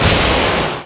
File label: Sbam; YAGG; Amiga 8-bit Sampled Voice